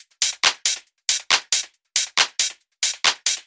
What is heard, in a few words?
Drum Loop With Noise Gate